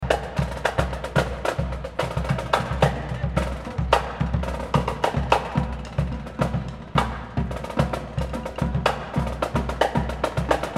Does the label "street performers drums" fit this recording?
Yes